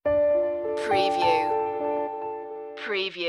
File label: autumn piano stinger